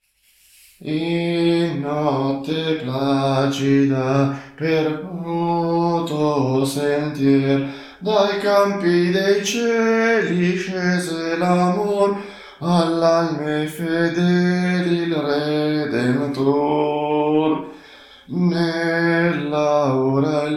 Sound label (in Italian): soprani; In notte placida